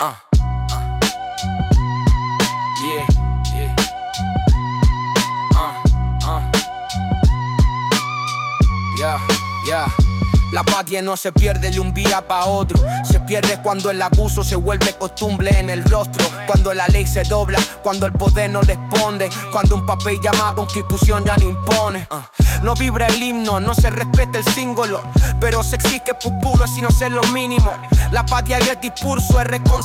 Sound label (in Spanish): rap